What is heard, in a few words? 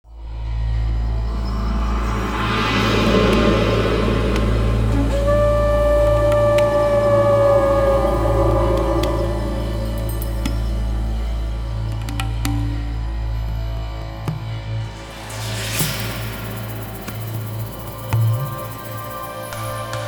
mystic rainforest; Berlin Production Music; animals dschungel jungle marimba minimal